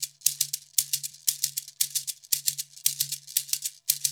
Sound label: 116 African Shaker; World; African Percussion